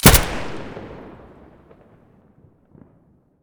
abakan muzzle stereo; STALKER; IWP

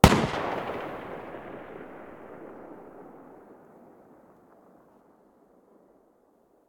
galil muzzle mono far

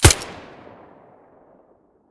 silencer fiveseven mono, weapons

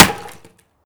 wood, bullet collide; STALKER; IWP

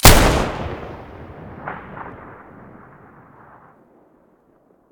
m590 muzzle stereo; mossberg

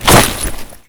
jump asphalt; STALKER; IWP

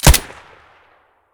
silencer stereo

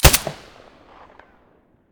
silencer glock17 stereo

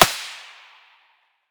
svu muzzle mono far, weapons

